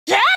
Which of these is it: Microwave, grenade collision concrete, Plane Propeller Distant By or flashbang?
flashbang